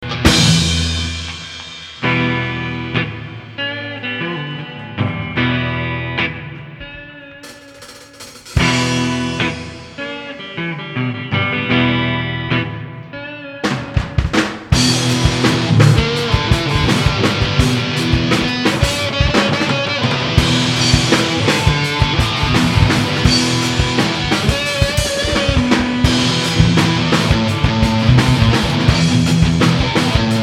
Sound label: instrumental